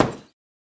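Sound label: Boxing Bag Hit Short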